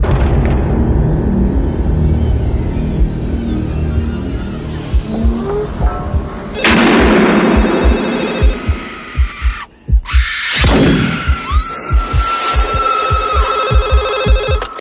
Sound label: Scream Phone Ring